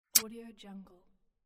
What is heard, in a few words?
button click